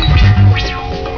ding

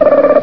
times up; usa

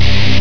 notinpuzz; germany

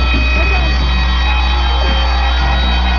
times up; venezuela

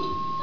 censor; usa

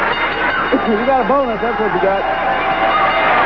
bonus

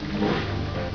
duel question

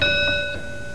ring in; usa

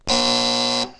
buzzer

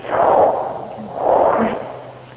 pendulum

